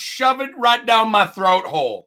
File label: throat